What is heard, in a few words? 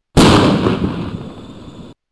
Tank fire